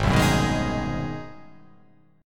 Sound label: G sharp 7th Sharp 9th